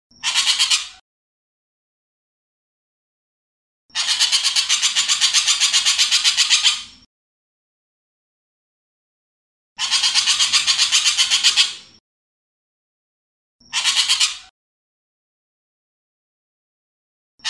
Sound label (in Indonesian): variasi ekek geling